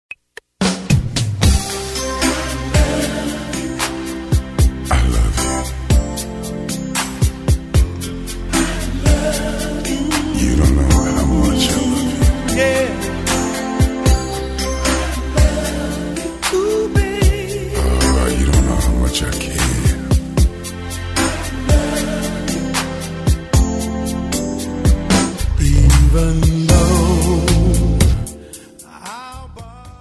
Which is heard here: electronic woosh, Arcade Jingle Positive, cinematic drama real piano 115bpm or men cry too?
men cry too